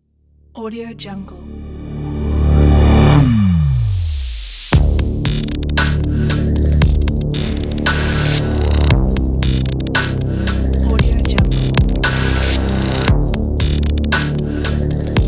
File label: glitch chill